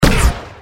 fire gunner; gmod; lvs star wars; arc170

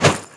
bat heavy, metalbat; melee weapons; tfa starwars melee weapons pack

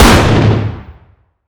fire dist 50ae pistol int; gmod; arccw uc commons attachments

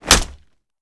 punch gun wall; gmod; arccw uc commons attachments

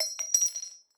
casing 40mm; gmod; arccw uc commons attachments

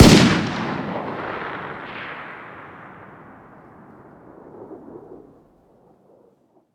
fire dist 308 rif ext; gmod; arccw uc commons attachments

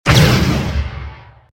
fire; lvs star wars

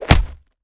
punch wall; gmod; arccw uc commons attachments

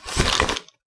board holster; hammer; melee; tfa starwars melee weapons pack

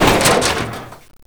impact soft, physics; gmod; lvs framework

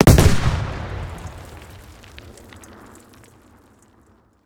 med close, explosions; gmod; lvs framework

